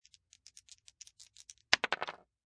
Dice Shaking And Rolling On Wood, Shaking dice in hand and throwing dice on wooden table.